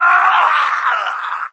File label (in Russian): krik